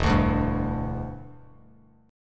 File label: E Minor 7th Bass Standard; strummed